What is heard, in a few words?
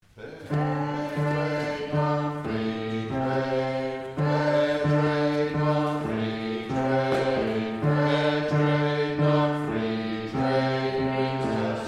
Bass